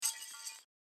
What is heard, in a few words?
cash